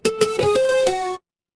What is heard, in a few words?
retro game game over